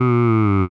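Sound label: voice sigh, male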